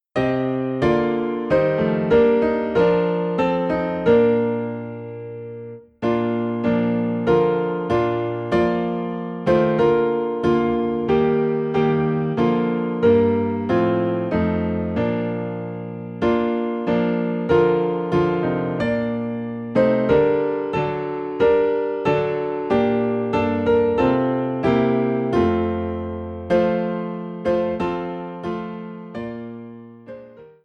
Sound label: Piano in Bb; christmas; Angels From the Realms of Glory